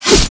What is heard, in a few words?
ATTACK